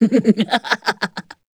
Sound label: succubus laugh long; queen girl